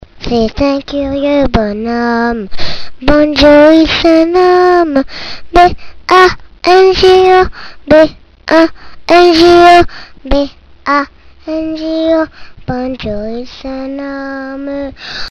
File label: banjo